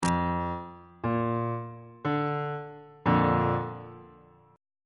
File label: a sharp major 2nd inversion on bass clef